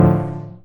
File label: Synth Sound; VEE Synth Shots